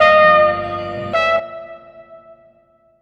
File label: Trumpet